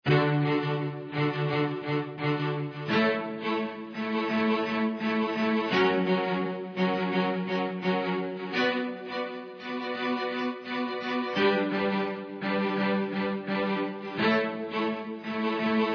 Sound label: Piano String Mood